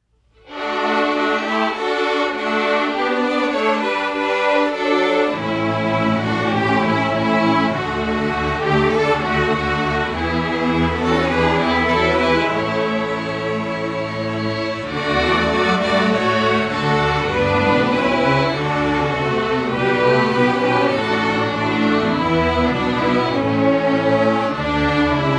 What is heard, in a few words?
String Orchestra; J D Alma Mater